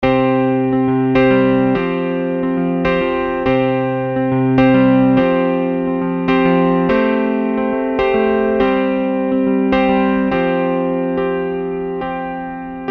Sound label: Electric Piano Piano Pop Ambient Ballad; EZkeys